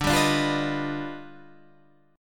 D sharp Augmented Major 9th D, chord

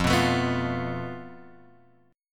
F Major Flat 5th; FMb5 Chord {1 2 X 2 0 1} strummed

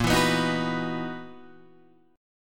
F sharp 13th A sharp

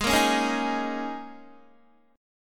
Ab Suspended 2nd Suspended 4th, down, Guitar; strummed; Absus2sus4

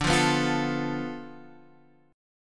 D-Minor 6th, D, Guitar, Standard; 10,8,9,10,10,x; Dm6 strummed

